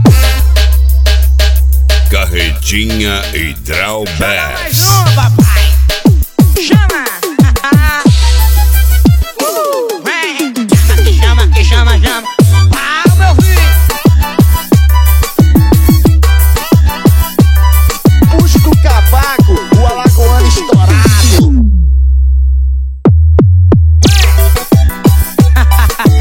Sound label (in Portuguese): piseiro